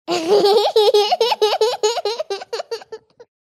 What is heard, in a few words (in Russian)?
malenkij chelovek smeetsja